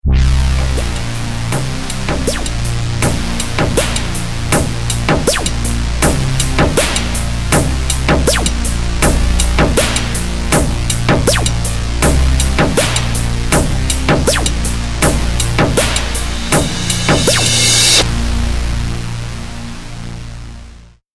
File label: Grinder Music Ident 80 Bpm; Musical Sound Effects